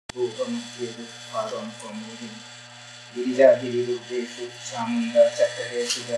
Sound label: noise removal camcorder badaudio